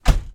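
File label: pontiac gto onboard door close hard interior; ambeo; Sonniss GDC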